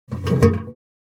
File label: Metal trash can lid opening